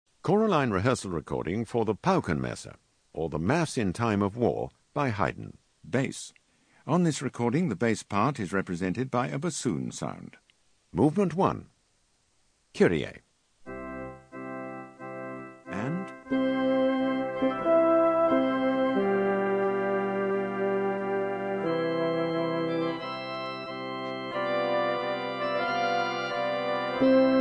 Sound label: Bass; Haydn Paukenmesse